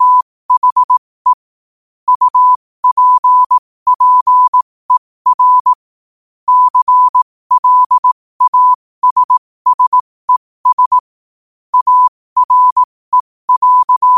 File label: 5 wpm, morse